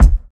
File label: dry steel kick drum g sharp key, bass drum one shot tuned to the G# note